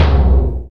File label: tom E; Greytsounds; ADD-ONE KITS